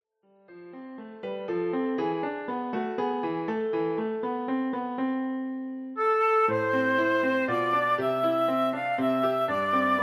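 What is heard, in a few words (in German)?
Querflöte; Greensleeves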